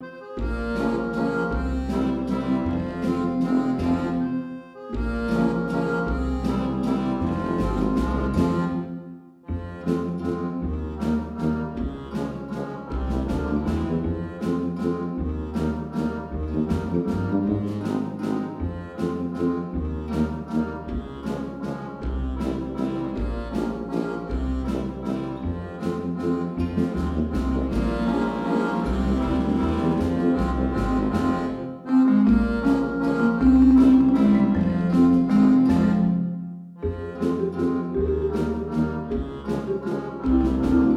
Tavern; Sogport

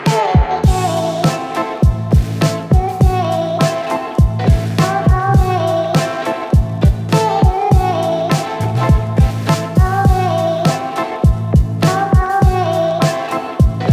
dance loop; AshAvenue; WrestleQuest

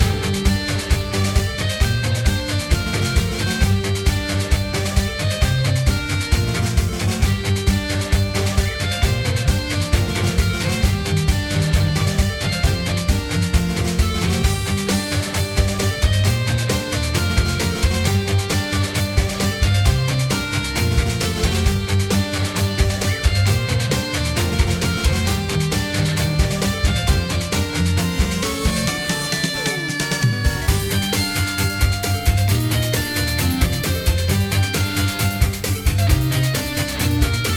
minigame loop; rise and shine linux ps4 switch windows xbox one gamerip 2017

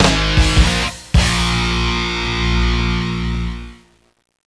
gameover song; Yakuza Ryu ga Gotoku Kiwami 2

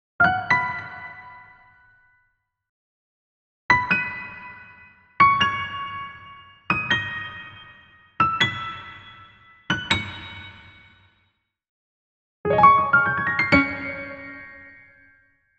Faerie Orb Jingle

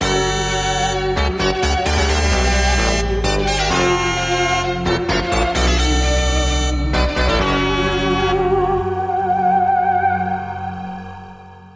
Evil Fanfara; Terrorbane

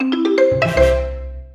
Jingle Bombpower

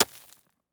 ice hit; teardown; ps5 windows xbox series xs

